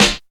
sparkly acoustic snare sample c key

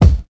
sharp steel kick drum sample c sharp key; kick drum samples

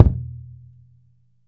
wet bass drum sample e key; kick drum samples

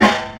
dark snare g sharp key; snare drum samples; one shots

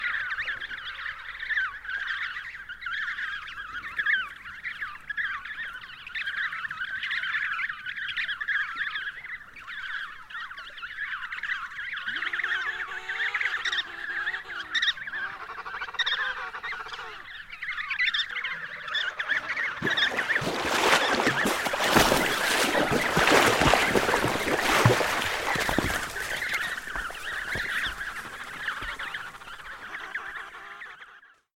emperor penguin chicks call; soundscapes

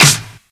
airy sizzle snare one shot A key

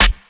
loud acoustic snare sound E key

